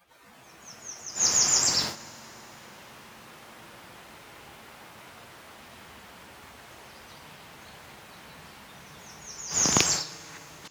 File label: Bird song